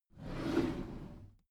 packed drawer smooth slide open

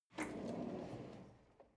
drawer cutlery draw out

